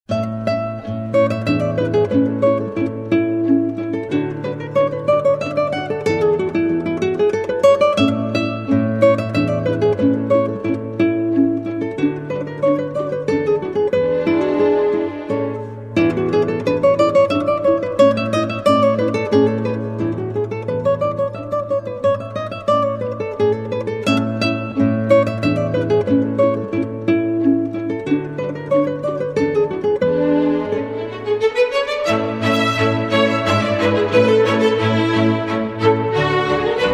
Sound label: Chitarra Concertante Gitarrenkonzert A-dur; Carulli